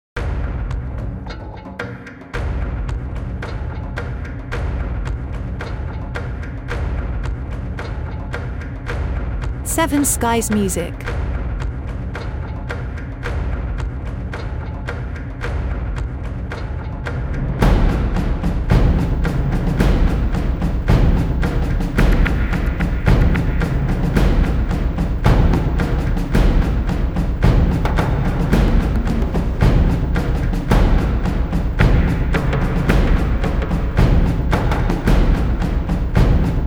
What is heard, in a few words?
Military Drums